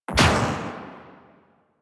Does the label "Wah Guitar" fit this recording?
No